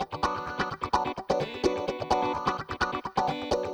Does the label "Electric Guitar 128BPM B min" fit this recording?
Yes